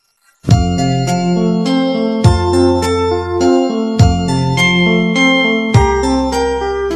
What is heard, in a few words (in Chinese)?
新年好 伴奏